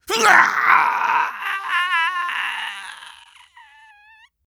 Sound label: male damage; lucius